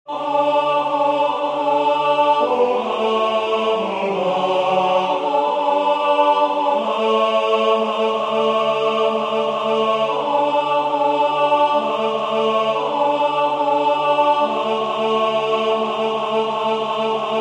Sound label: Joy to the world tenor; Concert du 13 décembre 2024 en l'Église St Pierre - St Paul